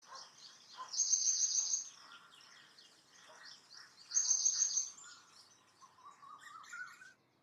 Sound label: koel call